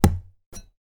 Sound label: knife stab into board or wall then release